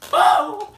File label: Screaming